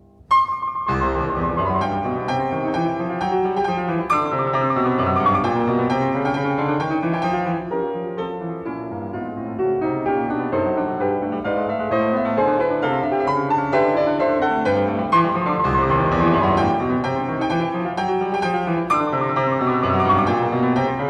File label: Allegro, C major, piano; Beethoven; Diabelli Variations in C major, op.120; Brilliant Complete Beethoven Edition